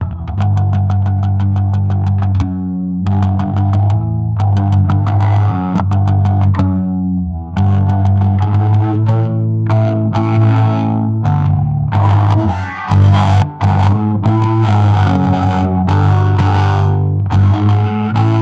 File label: bass guitar rok bit drag